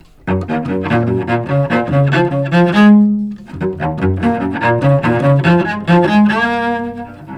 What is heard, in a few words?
Cello, RE-50